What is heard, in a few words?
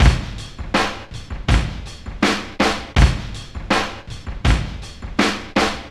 162, Loops, breaks; M8